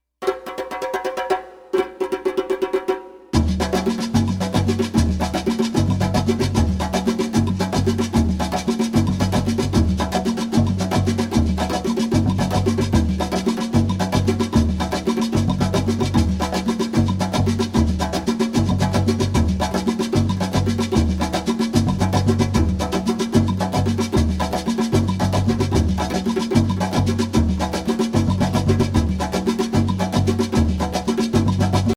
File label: Afro Brasilian; brasilian dance practice